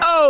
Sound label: "oh"; Voice